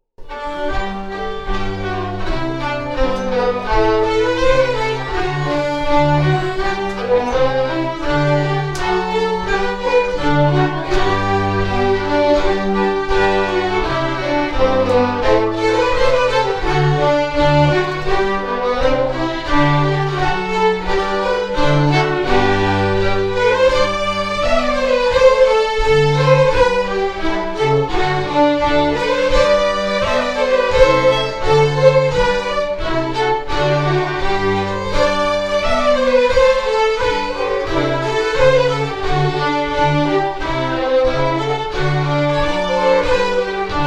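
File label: Strathspey as MARCH, Scottish Fiddling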